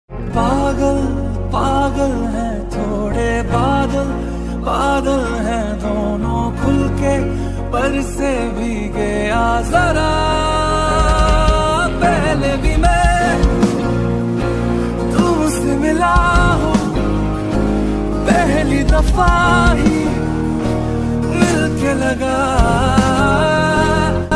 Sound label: animal movie ringtone song